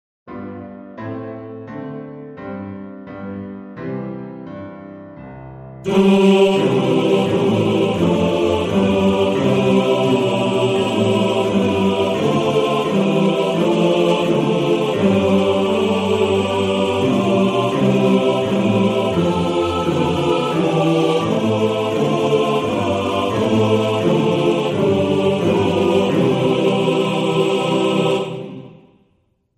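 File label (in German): Chor, Männerchor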